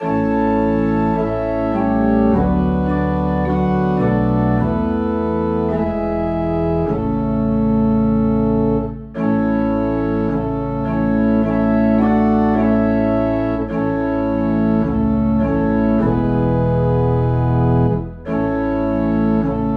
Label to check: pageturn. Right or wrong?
Wrong